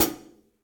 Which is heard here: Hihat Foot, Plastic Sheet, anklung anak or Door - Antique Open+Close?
Hihat Foot